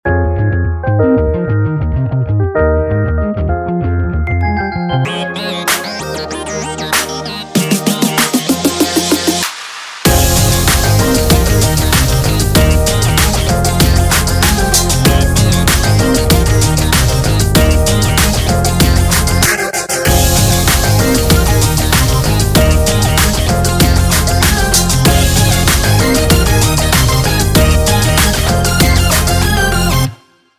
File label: futuristic